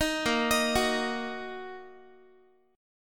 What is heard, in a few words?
A sharp Suspended 4th Sharp 5th down Guitar; A#sus4#5 arpeggiated; 18,18,18,16,x,x